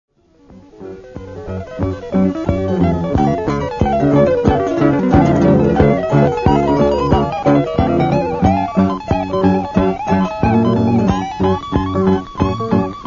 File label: mouse music